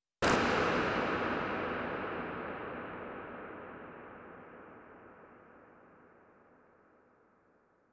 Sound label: water crossfade; Sound design for the opera composer: concepts and methods; Her face was of flowers audio cues